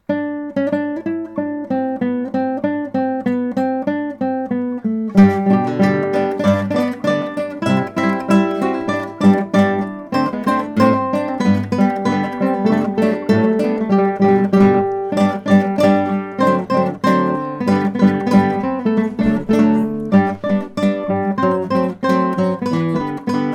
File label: Polka